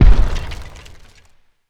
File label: garg close step; slender